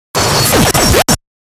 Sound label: fast attack; Fast Attack Glitch Hiss